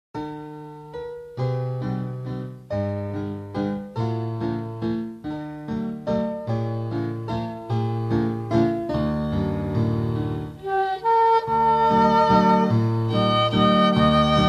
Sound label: INSTRUMENTAL